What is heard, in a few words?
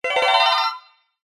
multimedia game sound short fast stuttering tone positive